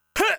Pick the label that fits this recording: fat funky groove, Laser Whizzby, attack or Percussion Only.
attack